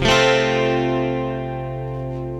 GUITAR, ROCK POP; MAGIX

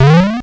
EAT GHOST

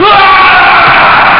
yell; DWPsychoWard

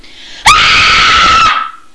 SHRIEK; SCARY

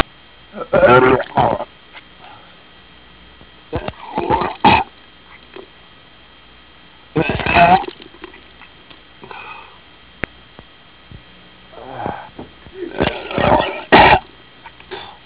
HURL; EFFECTS

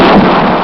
collision; ParadroidII

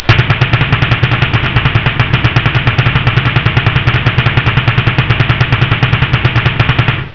DRUMROLL

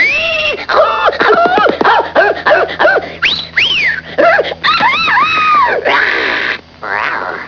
catcall; 999wavs

